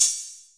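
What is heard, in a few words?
8000 hi-hat, Roland CR-8000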